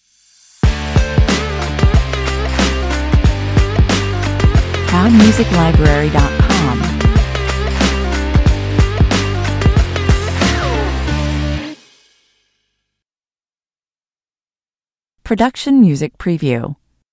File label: brave beats (stinger)